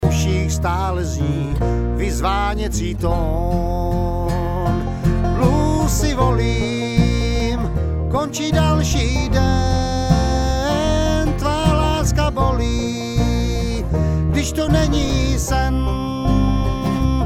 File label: vocLead, bluegrass